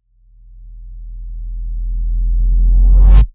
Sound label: Indie Rumble Reverse